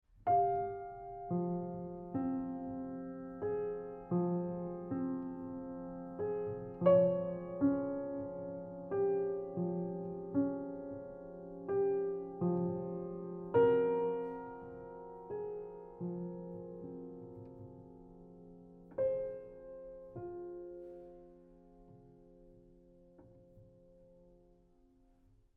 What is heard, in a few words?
Piano